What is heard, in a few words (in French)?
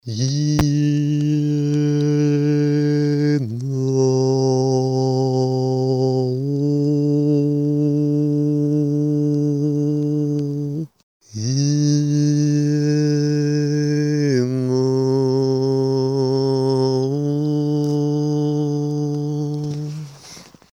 mantra energetique